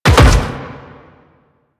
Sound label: Land Metal, footsteps; Warframe; The New War; Kahl; Quests